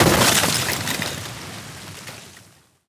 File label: ice break